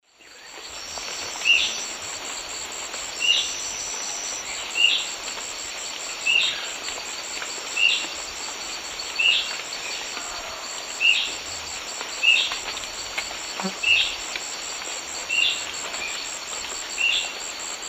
Myrmoborus leucophrys, call; S. America; Eastern Lowlands

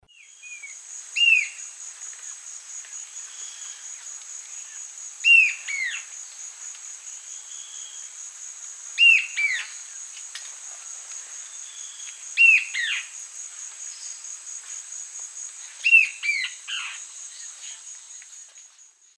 Myrmoborus lugubris, 2-noted call; S. America; Eastern Lowlands

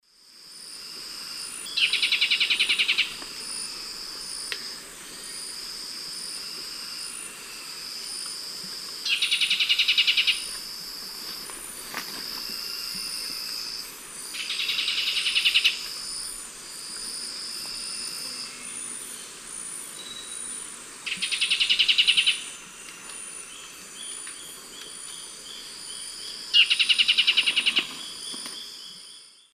Microbates cinereiventris, alarm call; S. America; Eastern Lowlands

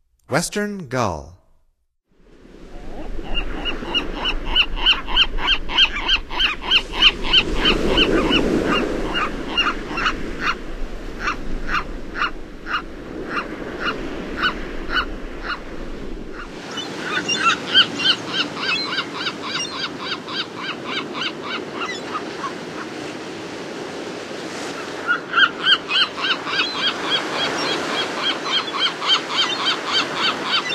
Western Gull, Songs of Birds of North America; Stokes

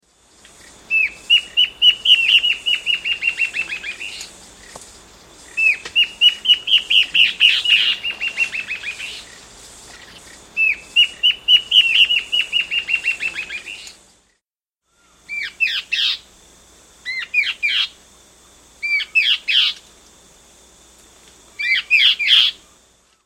Warbling Antbird; S. America

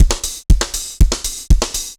dancefloor tight 120

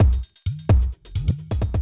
electro kit 130

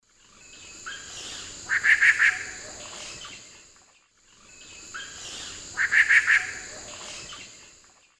Querula purpurata, call; S. America; Eastern Lowlands

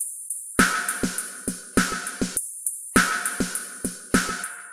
Emery Beat, Electronic Beats; Electronica

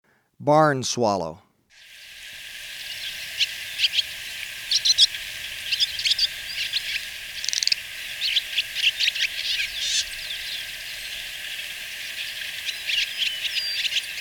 Barn Swallow, Birds of Ecuador; S. America

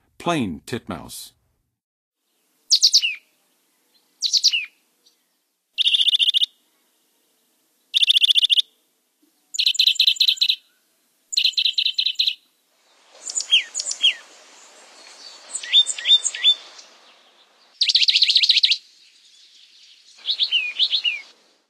Plain Titmouse, Songs of Birds; North America; Western USA; Western USA Songbirds

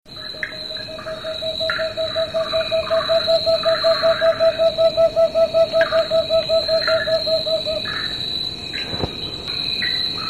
Cinnamon Screech-Owl; S. America

